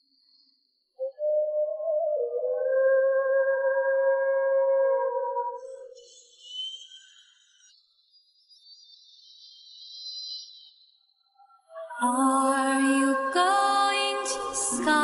vocal

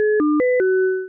startup

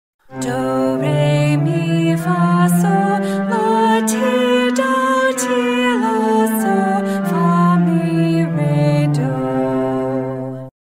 start doremi

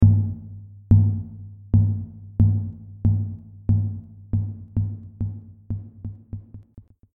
tennis

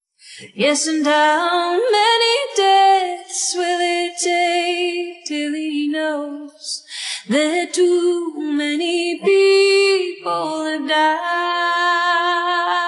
blowing vocal

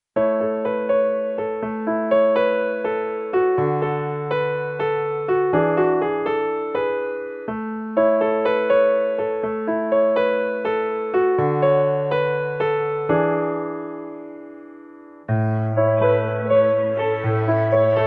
sun accomp

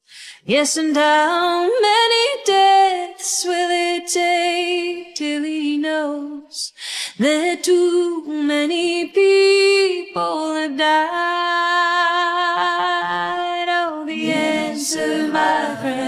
vocal

